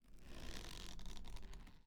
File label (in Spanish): Planta creciendo